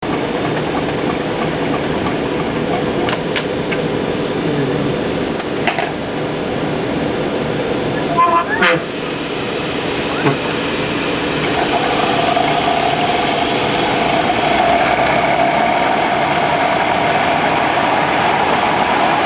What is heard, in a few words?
IBM 729 Rewind Read